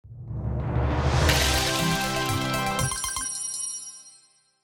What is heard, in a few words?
Slot machine casino bonus round intro